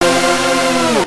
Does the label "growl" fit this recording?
No